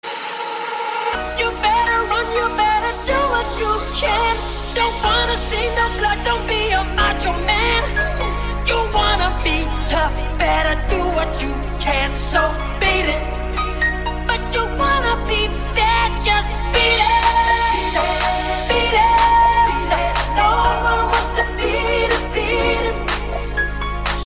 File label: iphone